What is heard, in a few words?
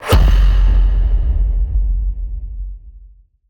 Sub Sweep; Antidote Advent